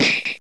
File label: player hit